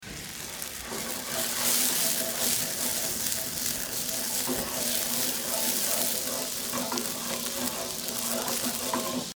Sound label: flambe